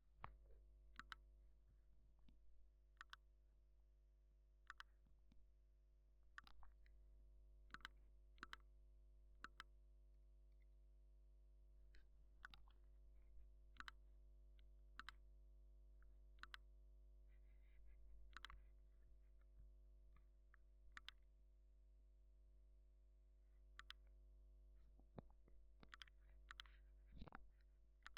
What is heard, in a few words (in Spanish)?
click raton